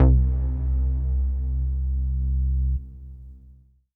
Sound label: Synth Prophet Bass C; One Shots; Electronisounds